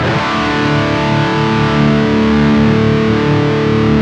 D Chord, Guitars

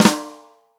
ROCK SNARE CHROMATIC, Real Drum Kits

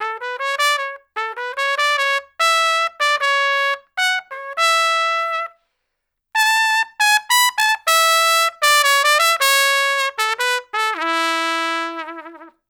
Trumpet Solo, Jazz; Traditional; Sonic Foundry (Sony Creative Software); Jazz Solos and Sections

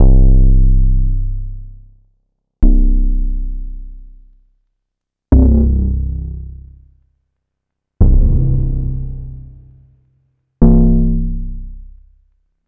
808 Experiment

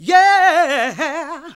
GOSP. Singin

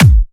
Dubstep Kicks

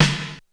Snare, Korg DSS-1; 300 Drum Machines

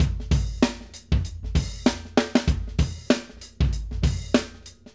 Acoustic Live Drum

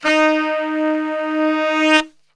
D#2 sax

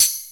PERC TAMB; E-MU Formula 4000 Series Vol. 2

